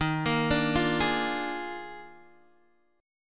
Eb Major 7th Suspended 2nd Suspended 4th, Guitar Open D; x,6,8,8,8,6; down